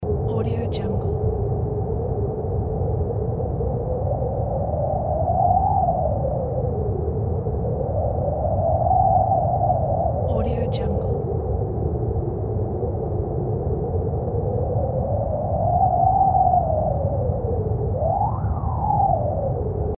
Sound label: winter ghost wind